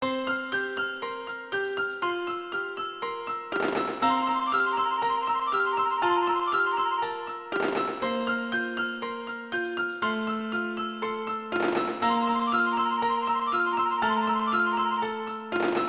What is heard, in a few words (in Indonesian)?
Nada Dering Nokia Jadul; Spring Field